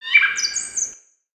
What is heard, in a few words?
Peaceful Bird One shot